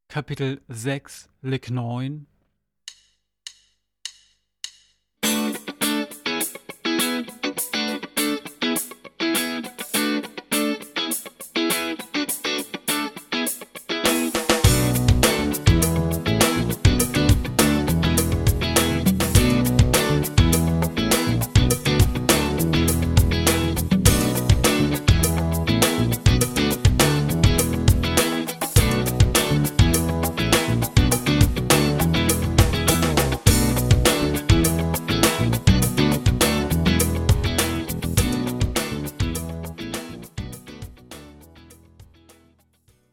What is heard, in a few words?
Latin Style Guitar